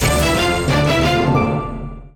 large Win

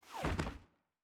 new bricks fall; netent

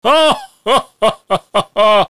Large Win; santa

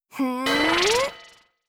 Green Charge; netent

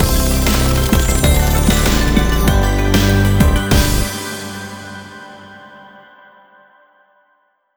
outro Free Spin; Stikky; netent

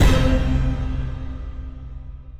win mid start; netent

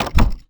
Reel Bounce

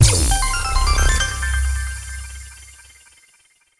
Medium Win

